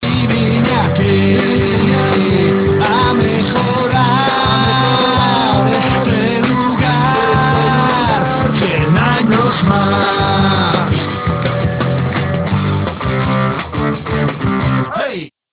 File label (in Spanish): tono real